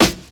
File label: classic hip hop steel snare drum sound e key